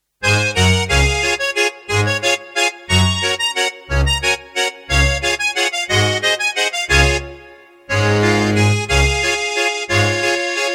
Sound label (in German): Oberkrainer Stimmungswalzer